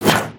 Brick Whoosh

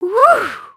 stink

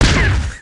cannon blast; destructibles

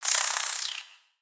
Spider Idle; Lego; creatures mechanical

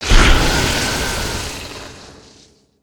Spider Boss Melee Charge Yell; creatures mechanical

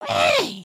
raincloud

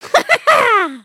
Clap

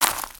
Footstep Leaves; creatures pets

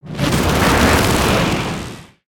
Lightning Swing; Spinjitsu

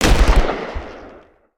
Flare Gun

